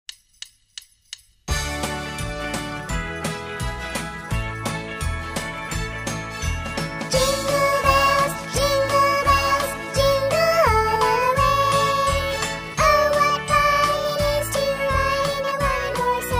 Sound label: jingle bells